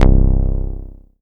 Rubber Bass; Best Service Gigapack 2

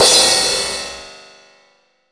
PROCYMB, CYMBALS; USB Soundscan vol.20 - Fresh Disco House I

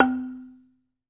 BAMBOO, Pitched Percussn, Bass Bamboo Mrmb; E-MU Producer Series Vol. 7 – Old World Instruments

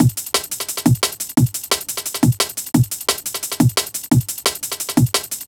classic dnb breaks, B, 175

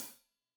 Hat Loose, Hi-Hat, Cymbals Stereo

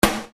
Snare Dr

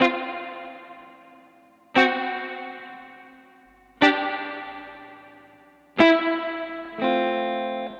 Guitar, 70s Analog Funk

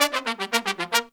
Pop & Funk Brass, R; Best Service ProSamples vol.25; AKAI

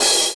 Breath HiHat; Sonic Foundry (Sony Creative Software); Drums & Drones; Construction Kits

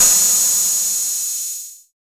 HH606, ANALOG, TR-606; Sound & Vision - Gigapack I

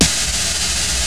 KOMMERZDRUMS

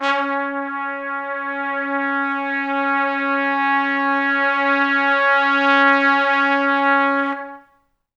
C#3 -L, Pop Brass; Best Service; ProSamples vol.19; AKAI

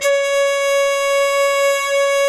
mute flute, hybrid winds

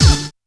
Uh! + Hit; Yamaha PSR-E323; Sound Effect Kit

